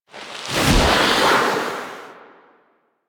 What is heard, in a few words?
Slides Off Ledge Foley; Cinematics; Warframe; The New War; Throne Room